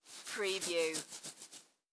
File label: Head Scratch Hair